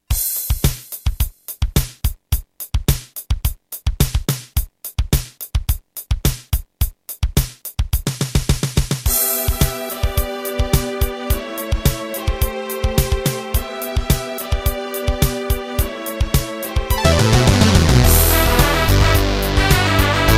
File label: Terratec MiniWaveSystem SOWT-11